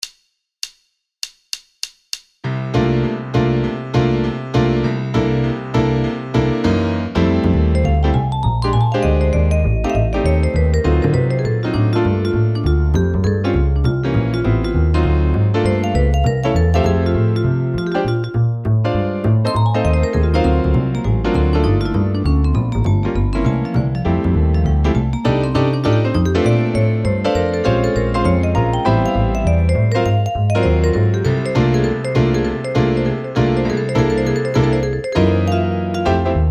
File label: Swing Jazz, drums